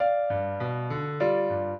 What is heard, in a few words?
trio, piano; Mozart